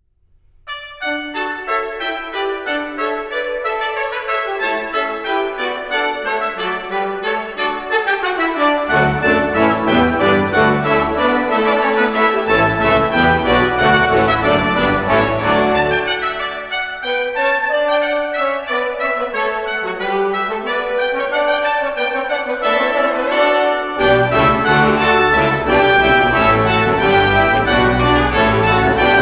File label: Ancient Airs and Dances, Gagliard, Brass & Organ